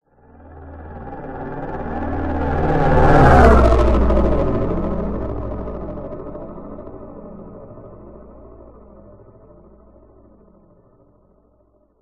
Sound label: PASS BY FLY BY; PMSFX